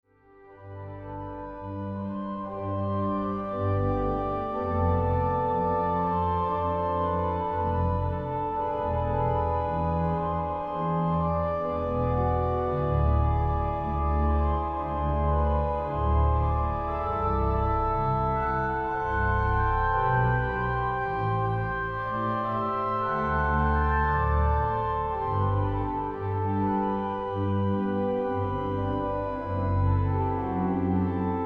orgelsolo; aria uit suite antique

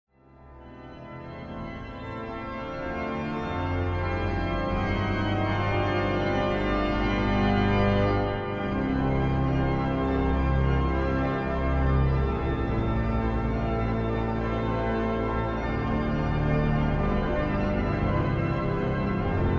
improvisatie

